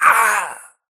grunt death quick; Titanfall 2

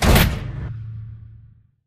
sliding door ext verb close start; Titanfall 2

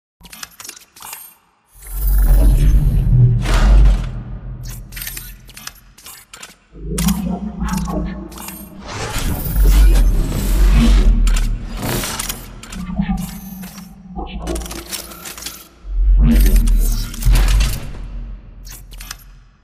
control room loop reverb; bt; beacon; Titanfall 2

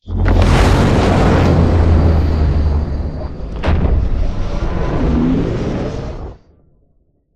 strafe; Beacon; Titanfall 2

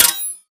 bullet light ballistic blades deflection

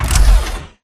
Mvmt FS Land Default; AI Stalker; Titanfall 2

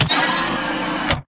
Mvmt Servo Damaged Head pivot Long; AI Stalker; Titanfall 2

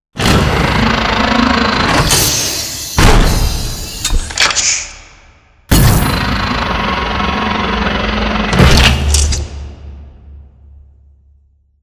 Assembly Wall Arm Close; Titanfall 2; Boomtown

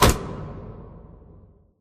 Giant Pillar Pods Stop; Titanfall 2; Boomtown

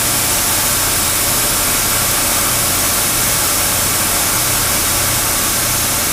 steam large; boneyard; Titanfall 2

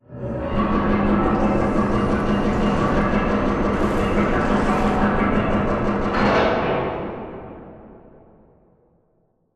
Lower Arm Track Back First; Boomtown; Sideways Town; Titanfall 2

